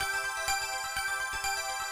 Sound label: count up player; spinsane